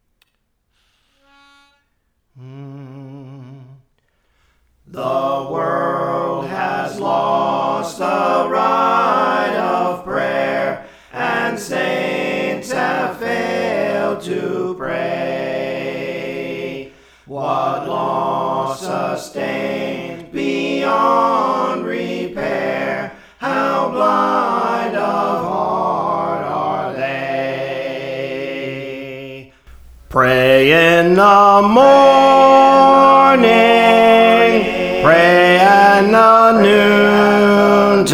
Bass Lead; Praise For The Lord Training

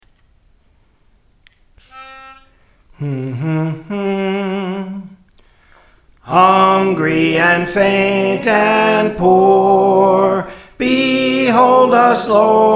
Soprano; Praise For The Lord Training

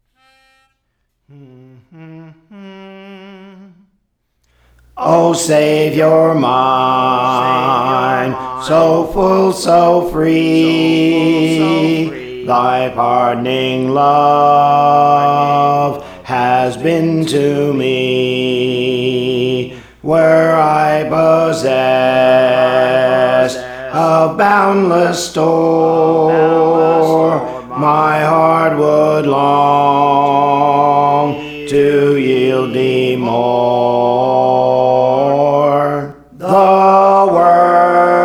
Alto, Songs; O Savior Mine; Praise For The Lord Training

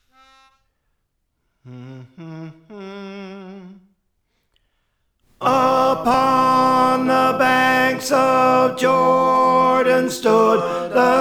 Tenor; Upon the Banks of Jordan Stood; Praise For The Lord Training

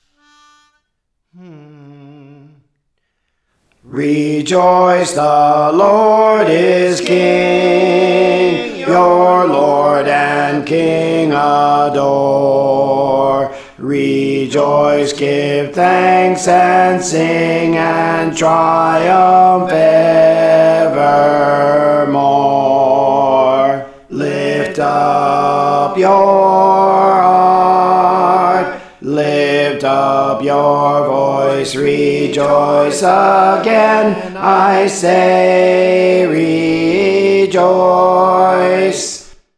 Alto; Praise For The Lord Training

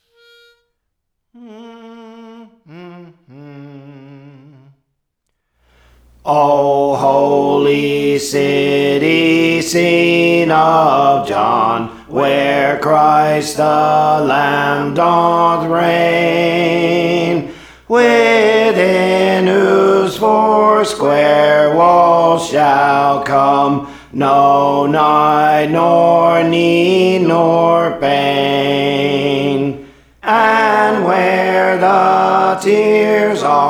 Alto, Songs; Praise For The Lord Training

